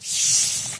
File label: chatter, wraith; doom3